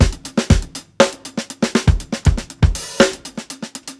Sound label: 120 BROKEN BEAT, DRUMS LOOPS; BASEMENT DRUMS VOL 2